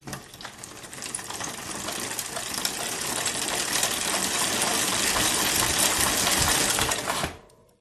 bicycle wheel rotating begins slowly accelerate then stops; Sound Ex Machina